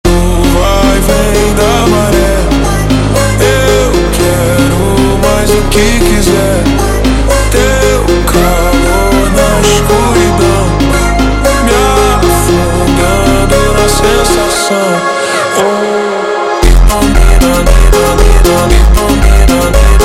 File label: Montagem slowed, funk